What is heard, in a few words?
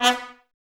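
brass trumpets B; Miroslav Vitous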